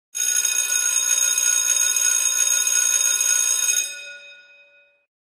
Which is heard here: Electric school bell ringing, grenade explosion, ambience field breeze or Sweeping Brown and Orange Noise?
Electric school bell ringing